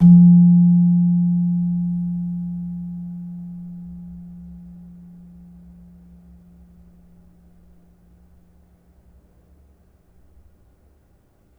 Slentem hard, gamelan; gravityharps; timbrebox

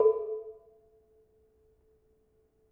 Bonang Barung soft, gamelan

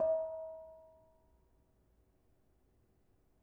Bonang Barung Pl 2 soft, gamelan; gravityharps; timbrebox

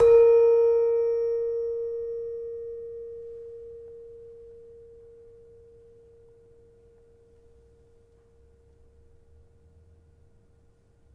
Demung, gamelan

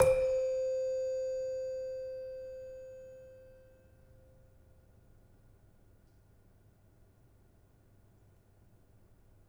Demung, gamelan; gravityharps; timbrebox

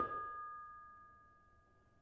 Bonang Panerus Pl 1, gamelan; gravityharps; timbrebox

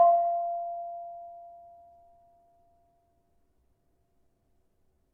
Barung 4 F, gamelan tuned

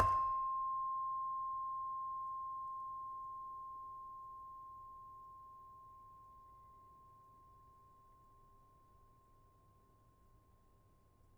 Saron soft, gamelan

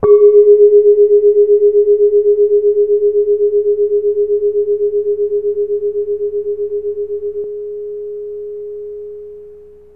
calung, gamelan; gravityharps

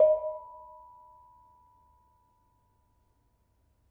Bonang Barung soft, gamelan

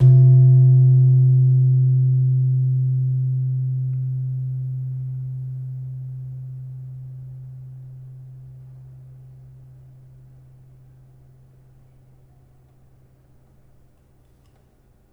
Slentem, gamelan; gravityharps; timbrebox

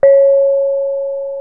reyong mid, gamelan; gravityharps

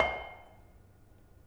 Bonang Panerus, gamelan

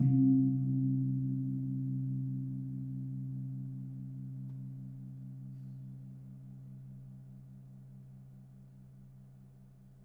Kempul, gamelan

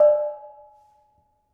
Bonang Panerus, gamelan

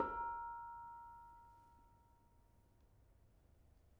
Bonang Barung Sl 6, gamelan; gravityharps; timbrebox

